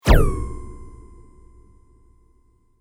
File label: Sweep Mechanical Fast; Foundation; Created sweeps, swooshes and other movement effects; Sweeper; Sound Design